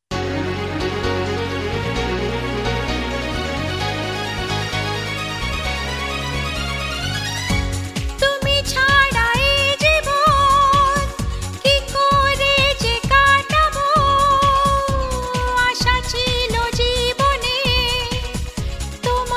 Female

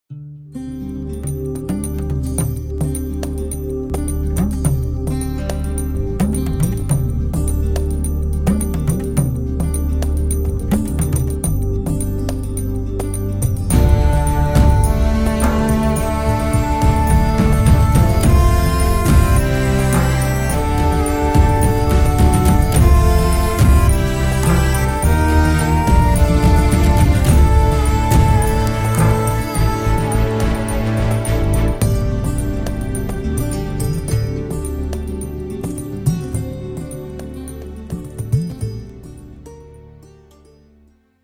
Instrumental Version

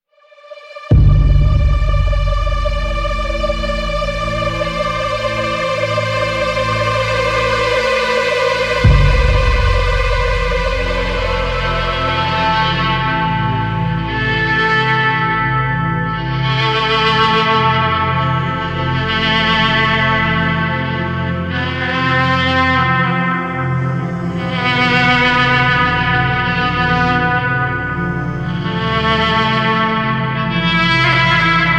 Instrumental Version

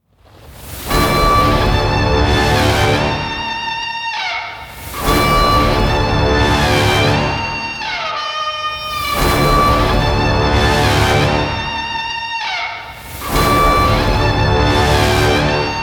Theme Music

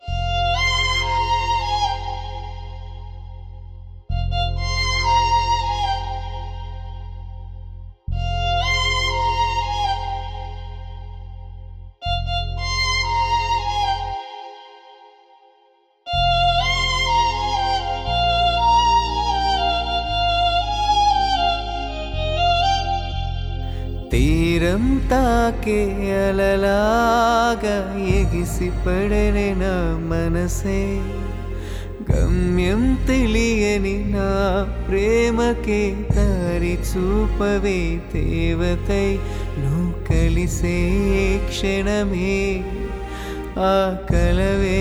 Sad Version

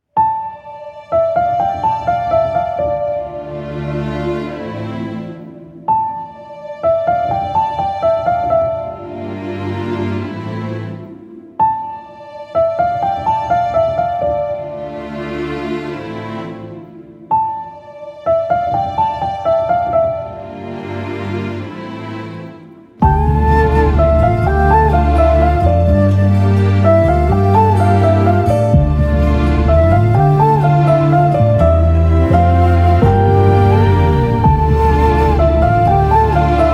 Shobana Insulted (Instrumental)

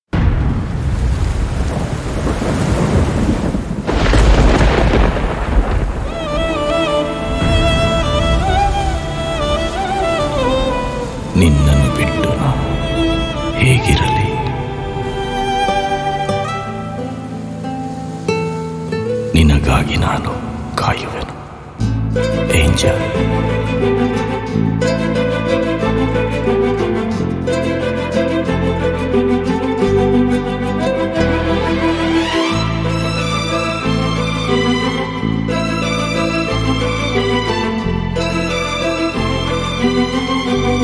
Strings

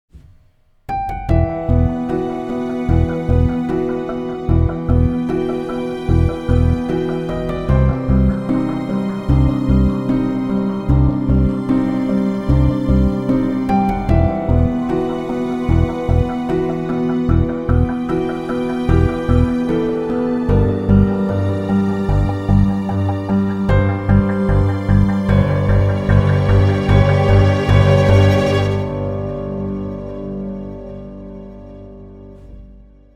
Promo Music

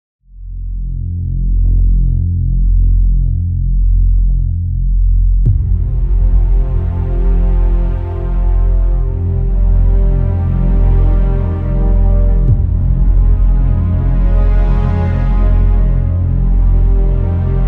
Instrumental

